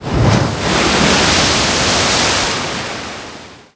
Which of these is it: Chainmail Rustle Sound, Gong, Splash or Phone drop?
Splash